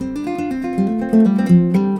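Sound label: SPANISH F, acoustic guitar 120 BPM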